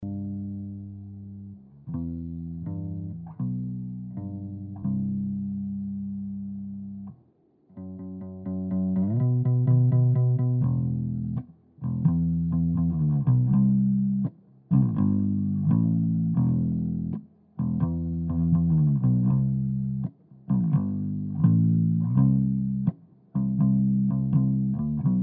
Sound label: hd bass